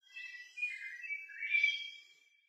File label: Amb Jungle Birds